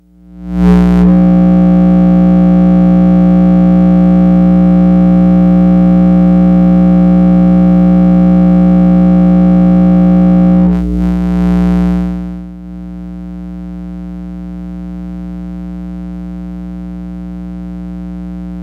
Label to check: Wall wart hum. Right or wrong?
Right